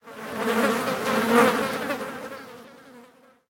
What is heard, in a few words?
flies, ambient outdoor